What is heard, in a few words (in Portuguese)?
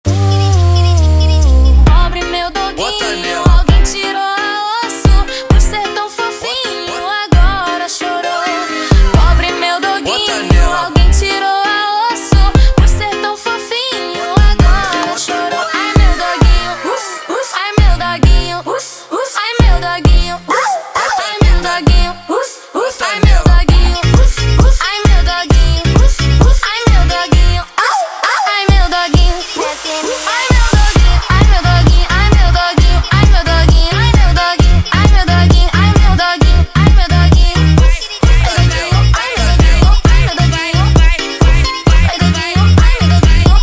Montagem